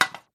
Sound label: porcelain hit; hits